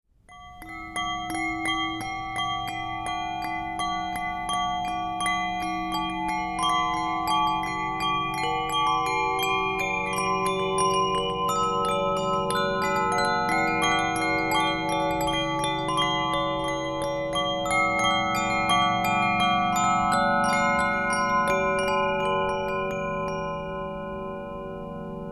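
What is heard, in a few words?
G major, E minor, 432Hz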